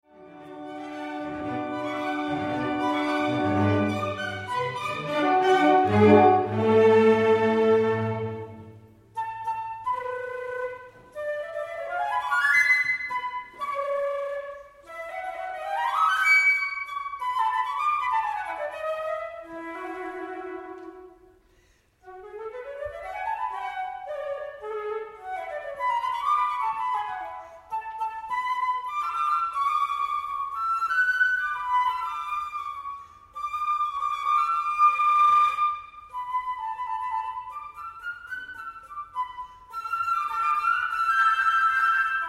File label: cadenza